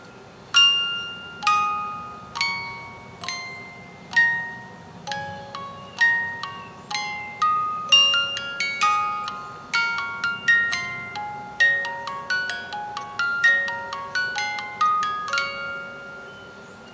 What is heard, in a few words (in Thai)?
กล่องดนตรี; Canon in D